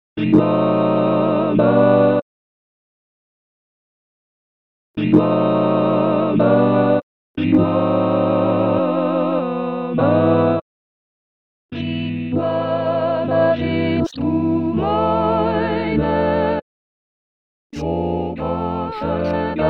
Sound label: tutti; Liszt; Psaume 13